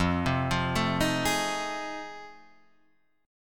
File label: F 7th Sharp 9th, Guitar, Half Step Down; F7#9